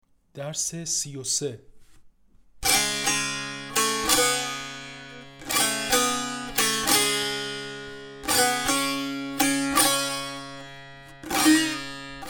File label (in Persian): مشق تنبور